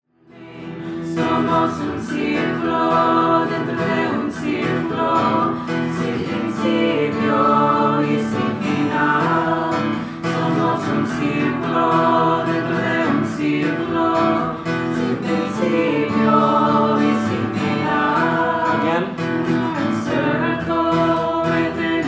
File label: Circle Guitar; Faster Version